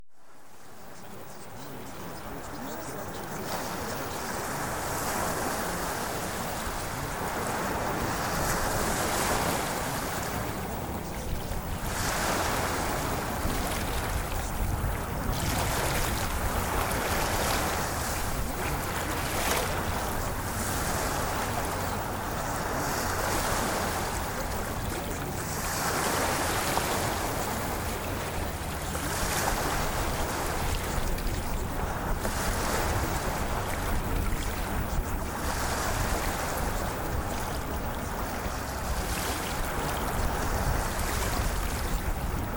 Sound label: Ocean Waves